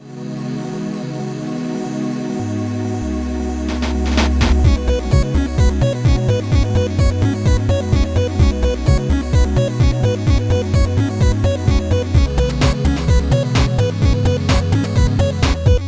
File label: trance; MED